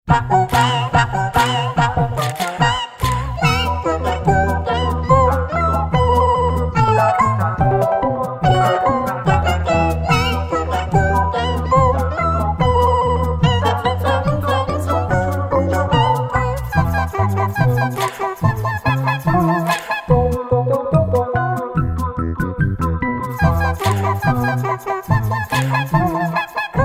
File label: Mice a cappella, ringtones; Samsung Galaxy M Style SHW-M340S